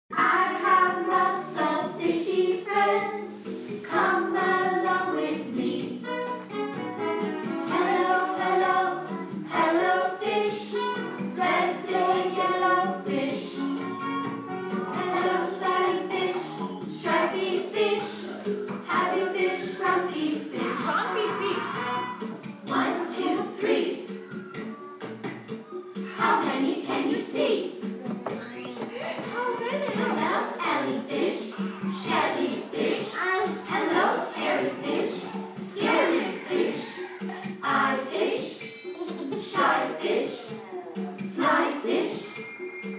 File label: silver class singing